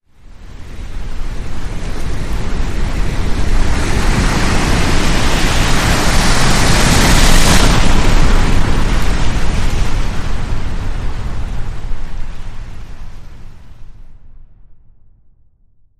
Surfer Wave Rise

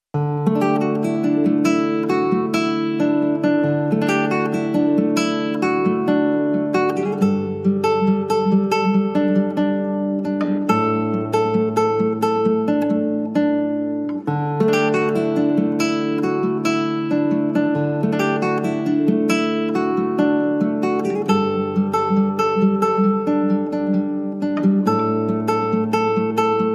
Guitar Only

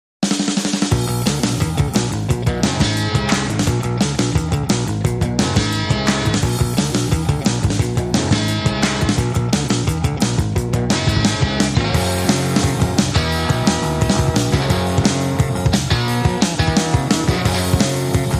No Lead Guitars

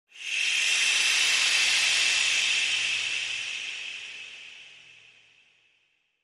Transition Rain Swell

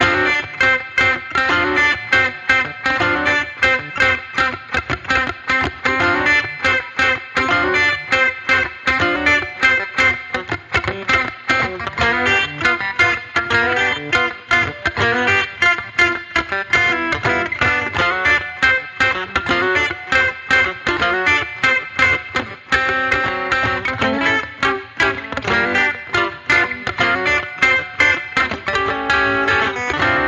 Electric Redneck Guitar Only

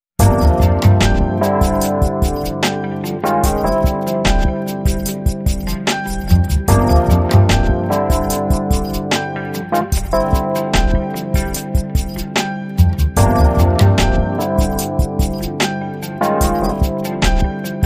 Guitar Chill

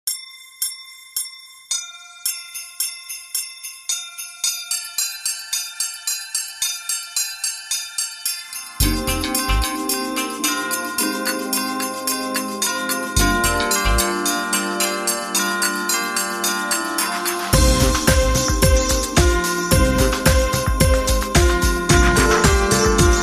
Funked Up Christmas

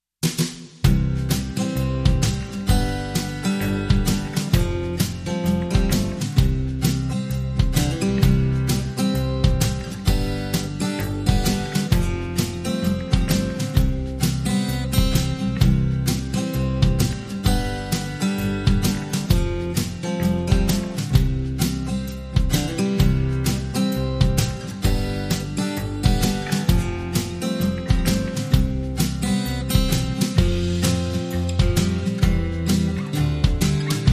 Underscore

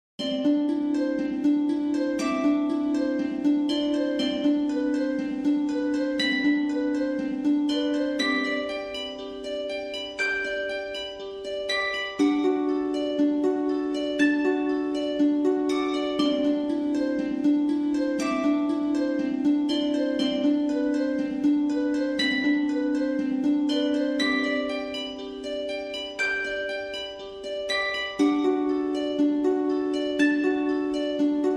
Harp Only

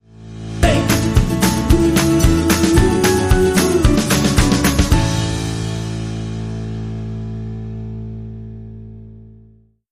Indie Anthem

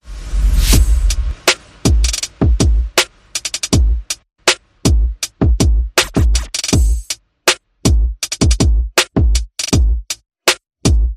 Perc and FX

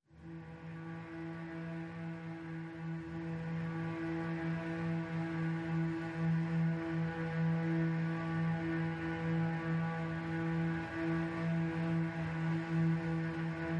Strings Only Mix

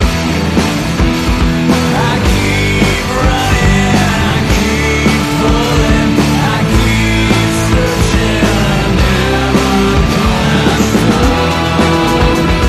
Bumper

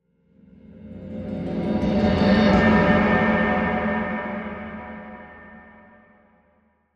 Low Roll

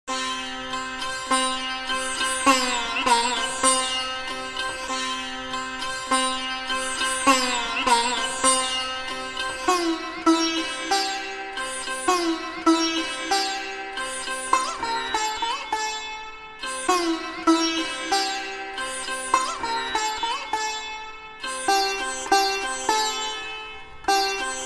Indian Sitar

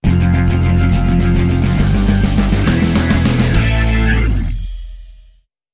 Blues

